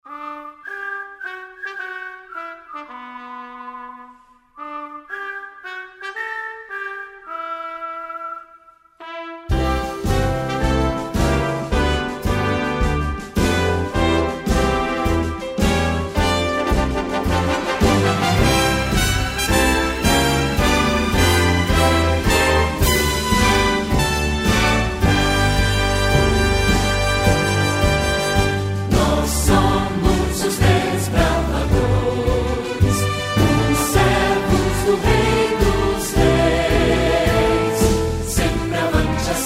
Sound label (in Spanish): Himno de los Conquistadores (Portugues)